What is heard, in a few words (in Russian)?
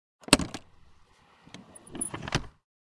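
bardachek mopeda, moped glove box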